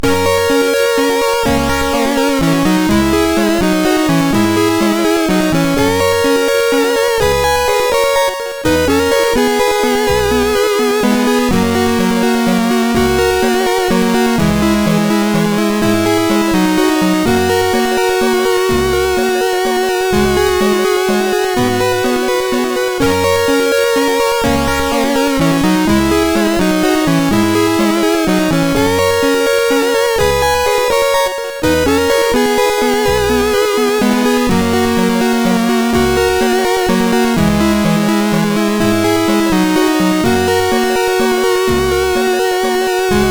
sid, MOS6581R2; Dreamy

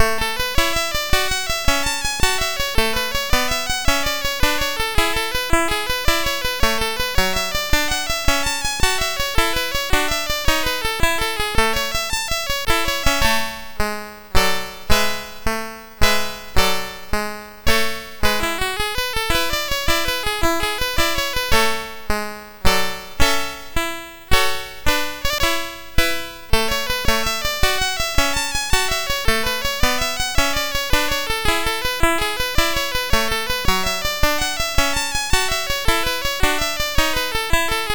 SX 64 Demo sid MOS6581R2; Commodore

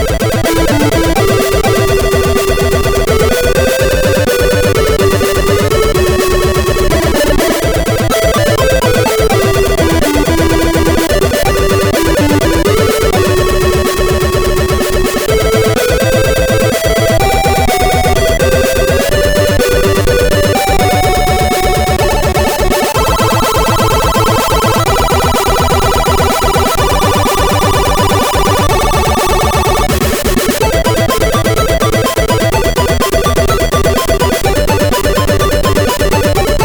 sid MOS6581R4; HVSC; Unused Demo 2 Remix

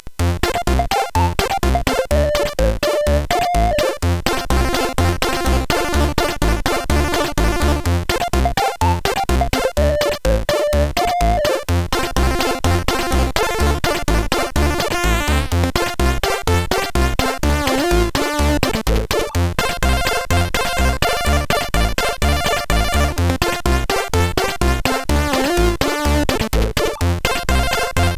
sid CSG8580R5; hvsc

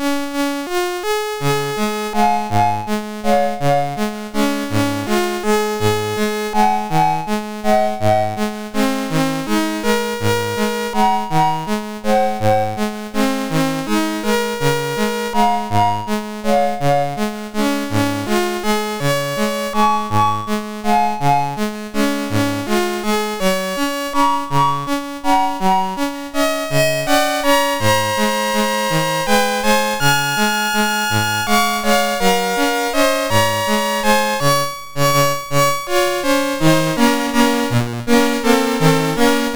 Synth Sample III, sid MOS6581R2

